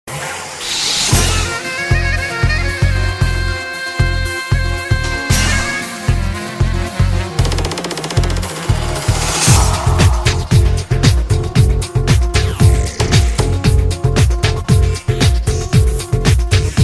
music ringtone

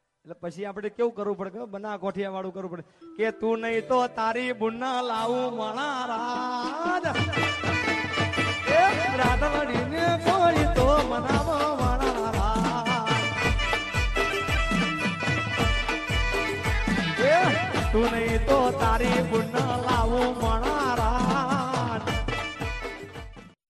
gujarati romantic ringtone

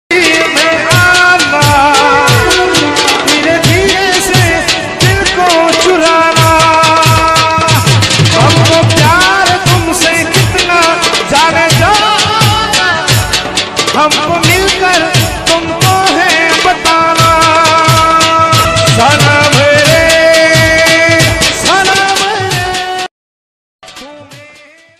gujarati sad ringtone

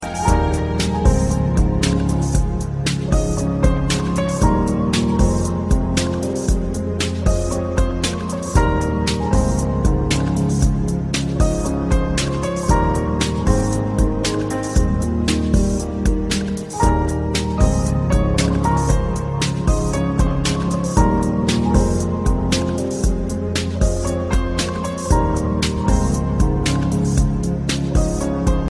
romantic piano ringtone

